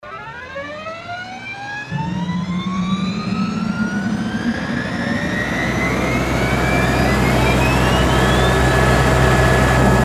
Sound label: heli start; helicoptervehicle